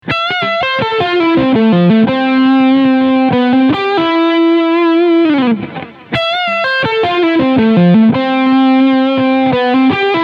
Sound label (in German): arpeggios spielen fuer gitarristen F maj7 Arpeggio